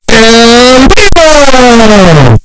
bass

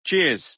cheers

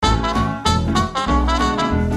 windy sample

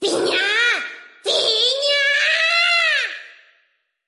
beam; pinya

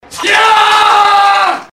yaaaaaagh

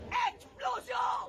explosion; macron

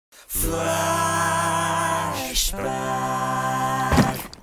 flashback; eddsworld

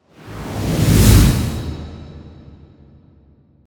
cast power long

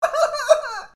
cry

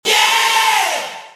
yeahh

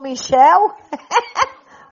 hehehe

